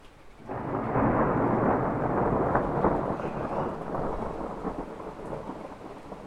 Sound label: field thunder spring, Beautiful rainy spring night, enchanting us by some great thunders and rumbles.; soundscapes